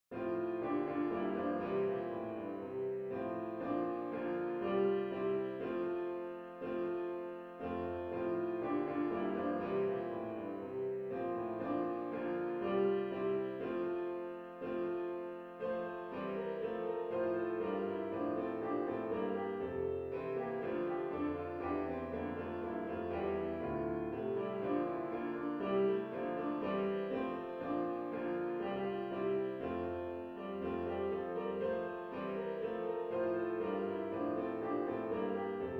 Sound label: ding dong merrily high